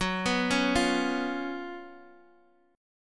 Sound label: Gb Major 7th Suspended 4th